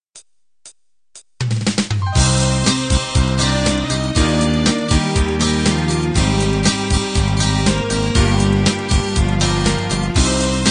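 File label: pop 16 beat intro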